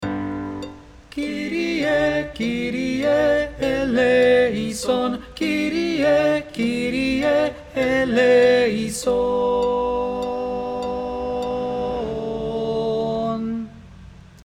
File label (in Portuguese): tenor; Kyrie